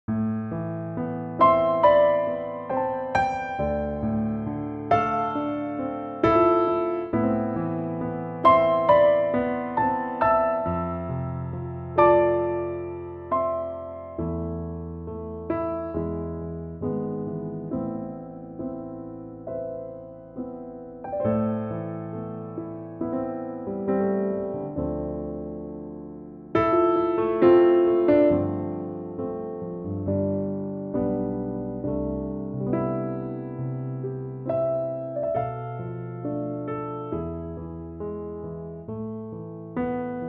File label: Am Piano